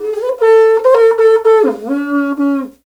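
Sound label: Afro Horn Phrase; Roland LCDP11 Africa VOL-1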